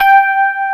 G4, Piano ELECTRIC PF

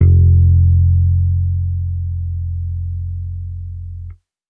Dusty Analog Bass F, Bass Guitar; 99Sounds; Monosounds; Music Loops; Instrument Oneshots

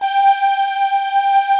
STRING SOFTS; Pro-Rec - Synthsonic; Z1 PADS

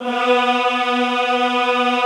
EHH B2, Classical Choir; Best Service

